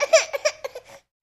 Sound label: Baby: Crying And Screaming.